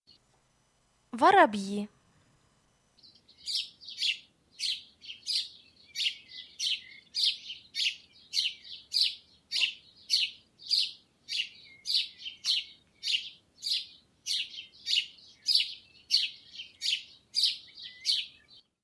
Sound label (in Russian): Воробьи